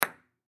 Ping Pong Ball, Ping Pong Ball Bounce Ping Pong Table; Ping Pong (Sound Effects:Sports)